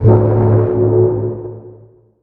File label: freighter alarm blare; no mans sky